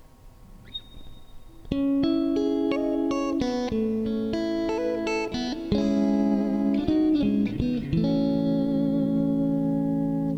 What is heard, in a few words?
reverb